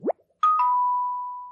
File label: inspiration emote; animal crossing